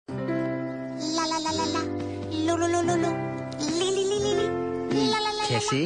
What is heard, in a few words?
singing